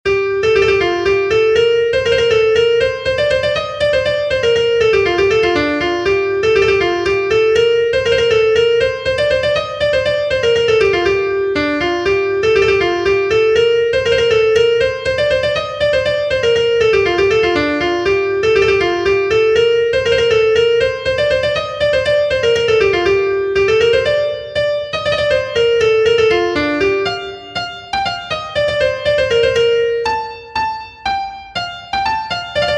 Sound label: Polska